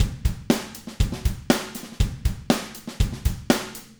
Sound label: loop